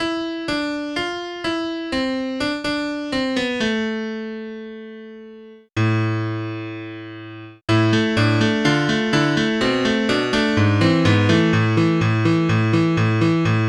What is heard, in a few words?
honky tonk